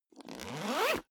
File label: zipper backpack open accelerate